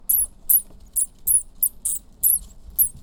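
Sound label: Diplomesodon pulchellum frequency-modulated squeaks; At arousal, adult piebald shrews produce calls, intermediate between squeaks and screeches; Loud squeaks of an adult piebald shrew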